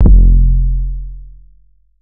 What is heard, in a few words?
808 one shot F; Slate Digital BASSHOLE Sample Pack